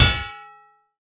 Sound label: Lamp Post Fall